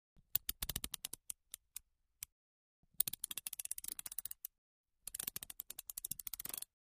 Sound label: ratchet slow, ratchet wrench